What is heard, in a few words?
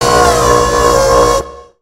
Robot Stunned; creatures mechanical; Lego